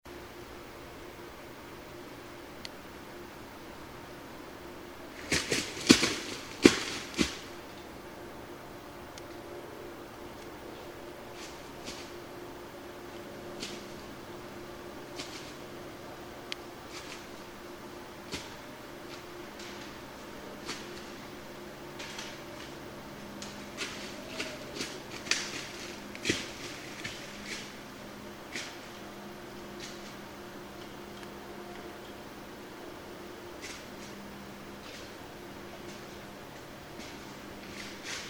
White-tailed Deer

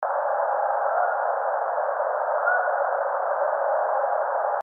Whoops

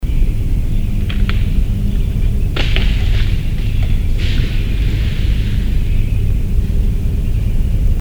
Tree Falling; bigfoot

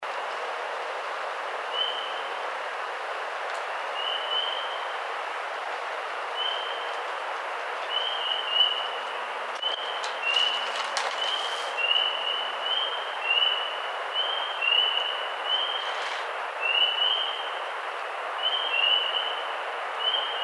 Barred Owl

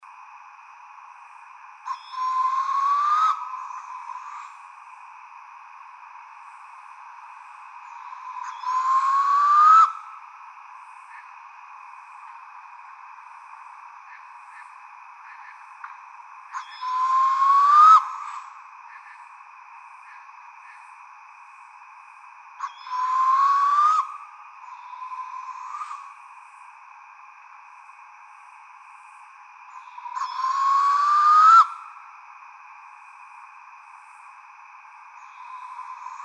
Barred Owl

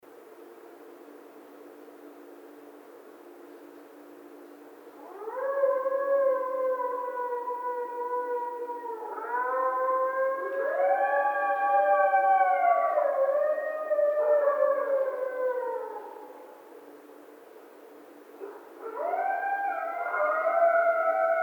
howl, Coyote

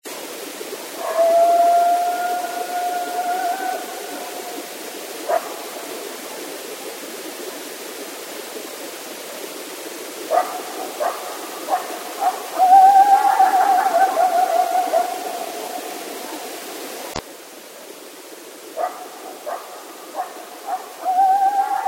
Coyotes; Coyotes (Canis latrans)

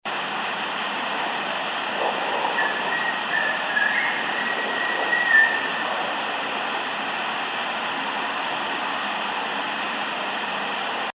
Whistle; bigfoot

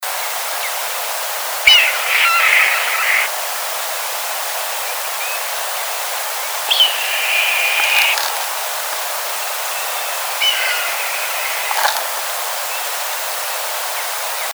Red-tailed Hawk

